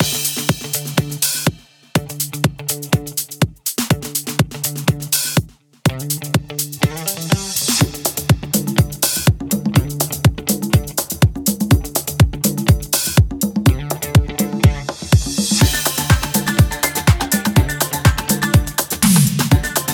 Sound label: guitar remix